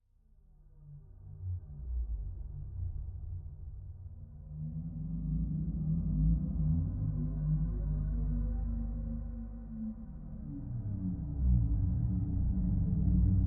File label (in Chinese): 水下低沉声; ambient